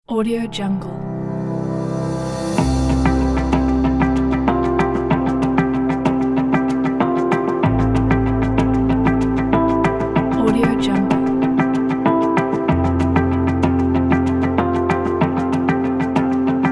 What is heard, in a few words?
traveling ambient corporate pop